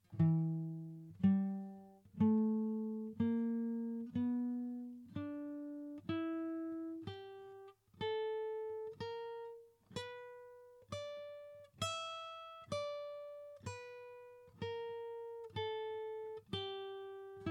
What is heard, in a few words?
E Blues Scale, guitar